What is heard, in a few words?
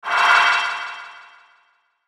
Whoosh Flutter Metallic Delay; Blastwave; Whoosh Sound Effects